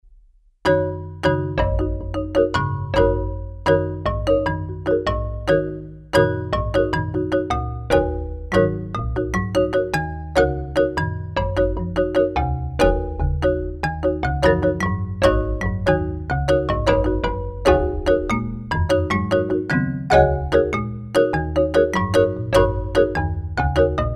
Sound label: Music for Marimba